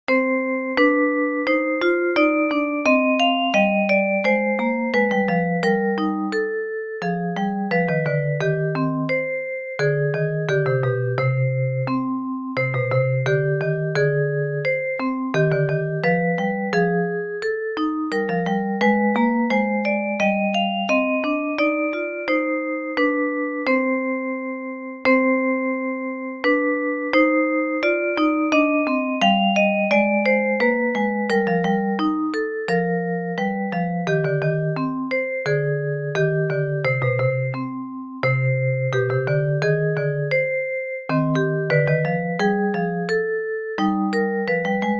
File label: vibra; Palindrom (oda-vissza) dallamok